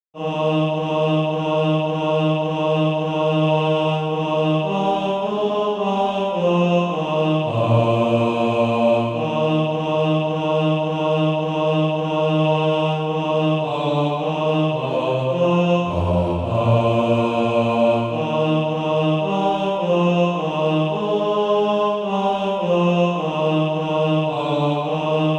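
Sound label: bass, Practise Music; From Greenlands Icy Mountains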